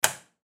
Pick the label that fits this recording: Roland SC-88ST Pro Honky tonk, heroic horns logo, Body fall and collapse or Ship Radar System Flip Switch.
Ship Radar System Flip Switch